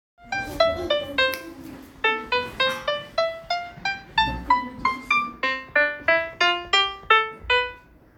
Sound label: piano